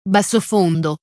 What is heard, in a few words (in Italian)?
basso fondo